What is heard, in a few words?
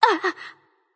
surprised; smite; persephone